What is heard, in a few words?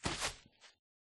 walk, turtle; mob; Minecraft